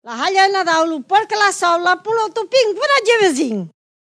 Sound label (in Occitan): halha nadau; noel bazadais